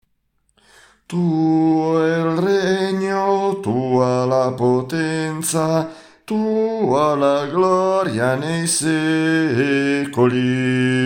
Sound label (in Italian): soprani; Tuo il regno